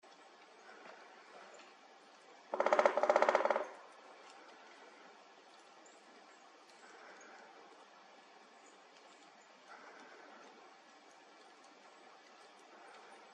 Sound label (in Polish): bebnienie, dzieciol trojpalczasty